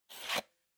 Floppy Disk Drive Eject

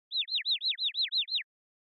Motion Dizzy Fast Short

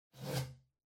Cardboard Box Far Label Peel Off; ダンボール; 遠い ラベル 剥がす; 梱包材

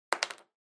Felt Tip Pen Put Down

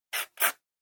Mechanical Pen Check

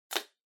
Drawing Box Ticket Open

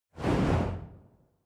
Camera Motion Dry

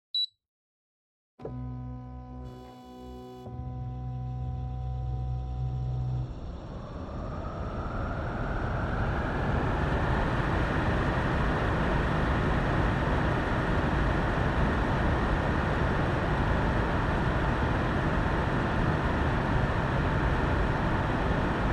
Air Conditioner High

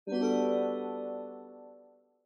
OS Sound (Speaker-Notification)

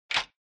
Retro Anime Switch High

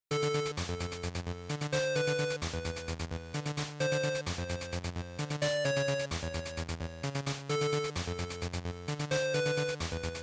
GB Shooter Stage

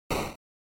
NES General Noise Single

